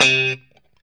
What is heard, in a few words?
POP C 3, STRAT guitar; Spectrasonics; Hans Zimmer Guitars VOL-1; JC-STRAT POP